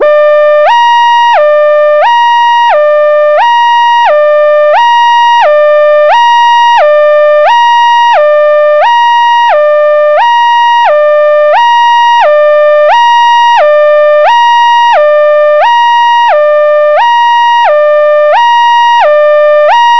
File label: police hi lo siren